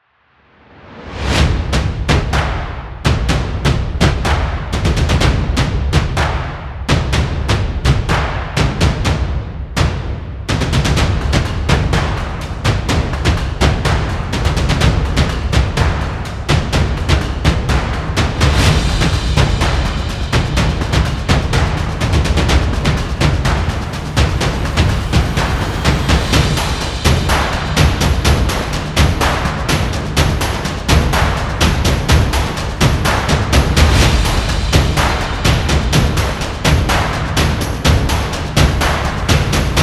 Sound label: Intense Percussion; energetic